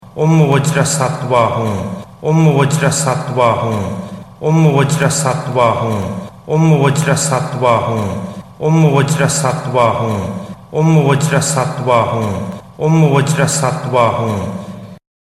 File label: vajra citta mantra